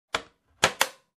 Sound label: Aircraft Cabin Cabinet Closed